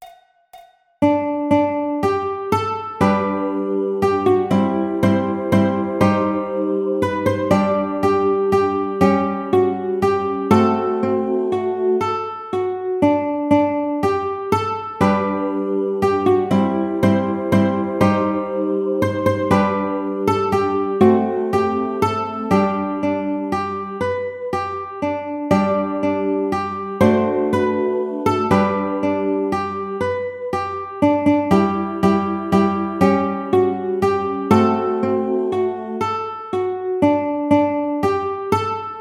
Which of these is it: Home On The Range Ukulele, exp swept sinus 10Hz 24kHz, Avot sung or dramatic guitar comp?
Home On The Range Ukulele